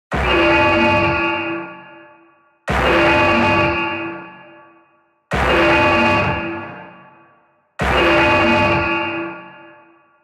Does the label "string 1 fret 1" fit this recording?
No